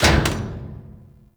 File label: Puzzle Switch Step; Warframe; Missions; Raid; Grn Puzzle Switch